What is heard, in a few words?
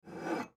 Liquor Bottle Up, Foley Liquor Bottle Pick Up Counter Top; Bars and Clubs; Blastwave